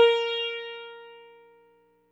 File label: EL SITAR HRD; Quantum Leap - Guitar & Bass